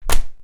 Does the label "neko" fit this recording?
No